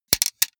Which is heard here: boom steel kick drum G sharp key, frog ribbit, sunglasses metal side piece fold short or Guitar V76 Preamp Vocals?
sunglasses metal side piece fold short